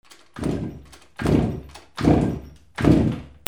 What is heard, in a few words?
VEHICLES